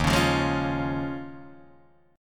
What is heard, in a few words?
D sharp 7th Suspended 2nd Suspended 4th, Guitar, Drop D